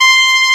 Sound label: oboe C5; Star Trek JR Audio